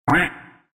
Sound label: cuuuuak